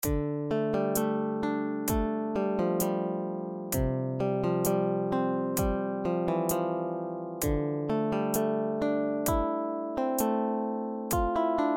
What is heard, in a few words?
Piano Test